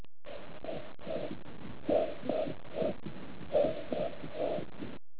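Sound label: whisper pectoriloquy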